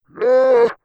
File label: TECHNO EXTRA 140